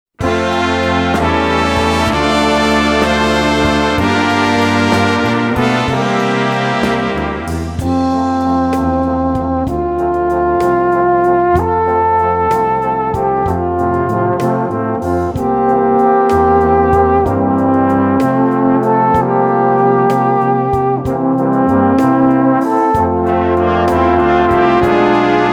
Alphorn Romanze

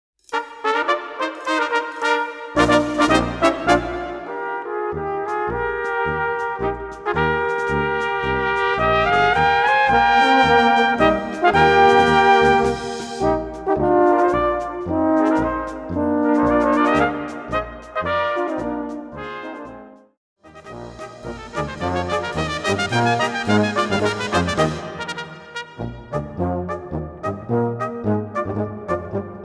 Polka

